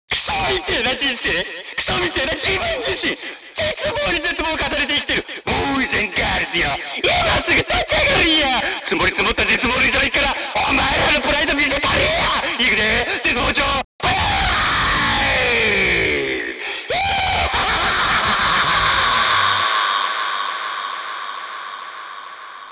karaoke bgm

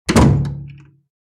Door close

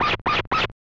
Bird Voice; Crazy Climber

